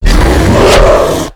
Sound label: slash; slender; quadralex